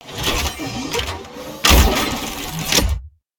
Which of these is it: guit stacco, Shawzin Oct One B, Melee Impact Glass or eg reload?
eg reload